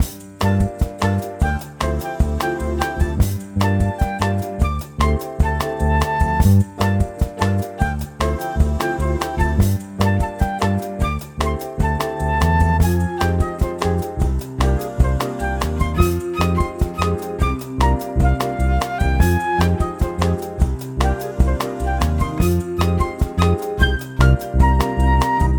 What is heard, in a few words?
Bossa for Flute and Piano; Original